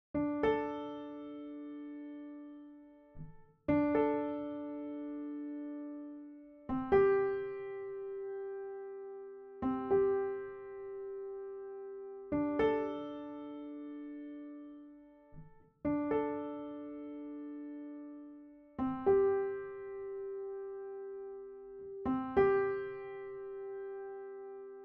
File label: Textures Cinematic Harmony